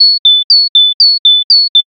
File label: bird